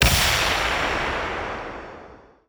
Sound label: big Win Super Mega Attention